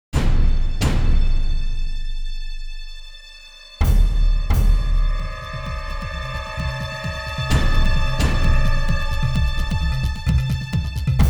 Music Big Boss

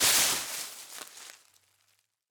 foliage hit

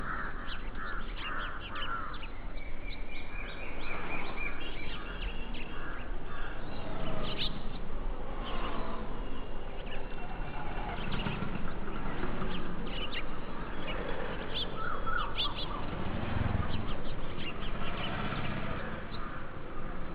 Amb Lahore; Street Cricket Champions; PSP

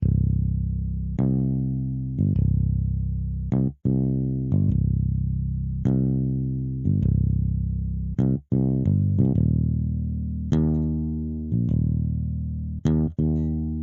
Elec bass

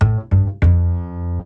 Bass Riff; Levels Hub Swamp; Music Ray

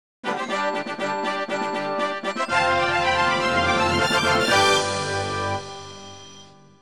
Item Collect; Star Fox Adventures

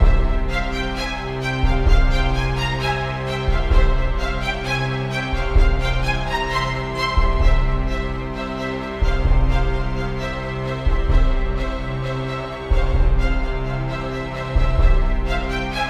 Nether Level loop; Rezrog; Depard; Gems of Rezrog

